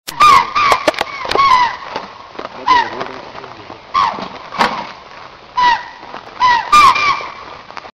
Okapi

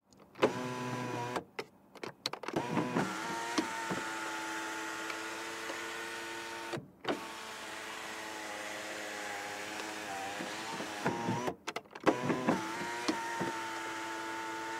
sunroof; سانروف ماشین

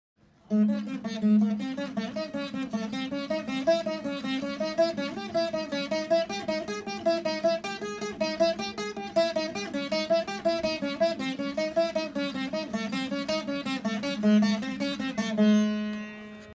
Veena

